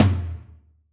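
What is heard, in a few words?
power room low, Kawai XD-5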